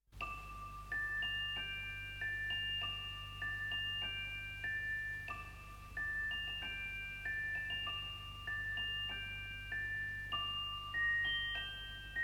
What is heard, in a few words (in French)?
Carillon